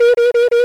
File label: Beep 4 Times